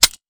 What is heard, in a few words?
weapon foley drop; Real Guns Sound Pack 2